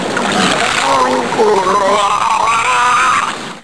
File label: drown, male; Digital Paintball 2